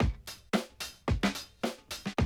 110, Classic Drum Loops

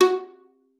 F#4, VIOLA PIZZ; Xsample VOL-5; 90 s Sample CDs

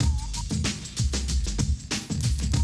Classic DrumLoops; Best Service; XXL 1500 Classic DrumLoops; Sample CDs

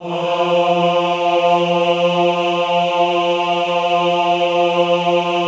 AHH F2, Classical Choir; Best Service; Extended Classical Choir; AHH MIX

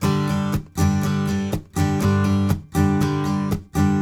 Blues Guitar Samples, RIFF; ILIO; Fretworks

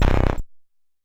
Tom Lo, Stylophone Beatbox

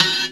spruce, percussive

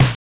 Snare, Genesis; Rolling Thunder 3; M8

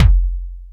Nu Kick, Yamaha FS1R

